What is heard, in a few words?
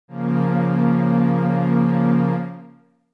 Bb Major